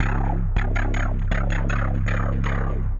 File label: AMBNT, 160, ambient